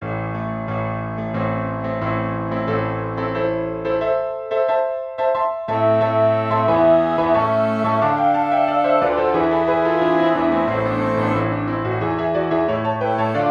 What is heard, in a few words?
for piano woodwind quartet; haendel georg friedrich; with thunder armed great god arise